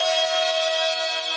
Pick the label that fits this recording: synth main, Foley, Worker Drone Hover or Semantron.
synth main